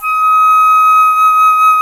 FLT C FLT, Flutes, Flt Brt; Roland LCDP04 Orchestral Winds; 90 s Sample CDs; C Flutes 3-8

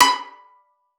B5, VIOLIN PIZZ; Xsample VOL-5; 90 s Sample CDs

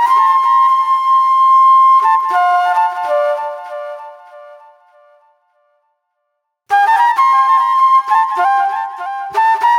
Acid Trip Flute

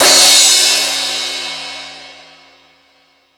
Crash, Drum Machines E-MU Pro-Cussion

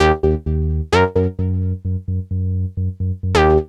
Gated Synthline (130BPM); musicradar

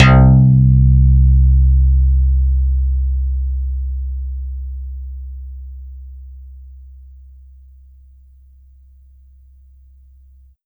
FM FONK BASS, Bass Analog & Synth; USB Soundscan vol.12; AKAI

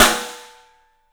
STEEL S, drums; 3D Sound

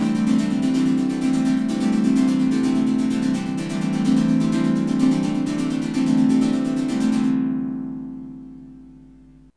Trance SYNTH SEQ; AMG; Global Trance Mission VOL-2

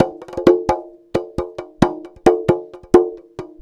SMALL CLAY DRUM; Sonic Foundry (Sony Creative Software); Vir Unis Infusion

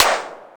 SYNTH SD, DANCE KIT

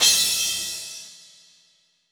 Rock Crash, Roland BK-5 Cymbals

